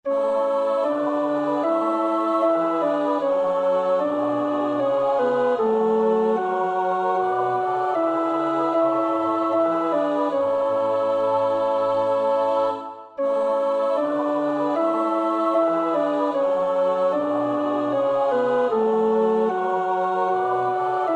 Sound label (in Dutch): tenor, koorpartij; Bach (Johann Sebastiaan); BWV 132